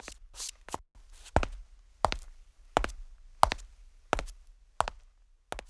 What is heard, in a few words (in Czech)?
kroky; Mafie 1